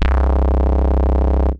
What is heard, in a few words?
Bass; Kairo Bass; Analog; Ableton; Factory Packs; Synth Essentials